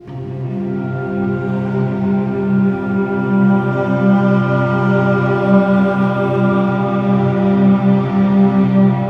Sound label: Abandoned Strings